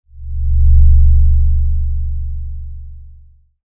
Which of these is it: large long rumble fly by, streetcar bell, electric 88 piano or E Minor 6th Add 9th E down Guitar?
large long rumble fly by